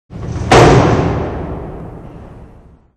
cell; WMBonusXP

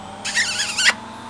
kiss; Sound Library 2000; Wayzata Technology; People

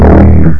deep tuba; Sound Effects for Multimedia - Volume 1

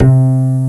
Acoustic Bass C3; Hexen Music; Hexen 6vox

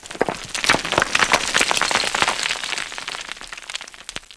rocks

